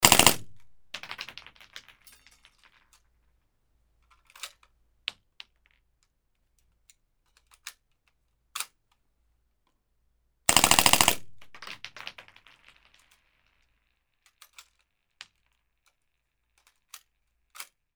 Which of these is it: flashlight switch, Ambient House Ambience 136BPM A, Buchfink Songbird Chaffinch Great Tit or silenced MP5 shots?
silenced MP5 shots